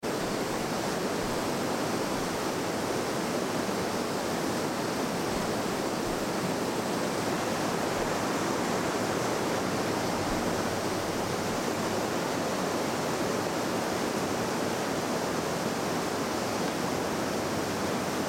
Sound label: river